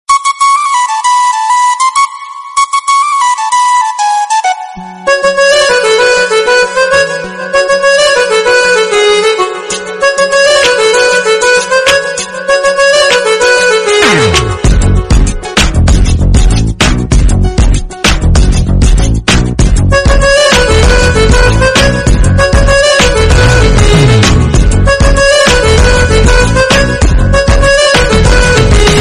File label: cool pop music cell phone tone ringtone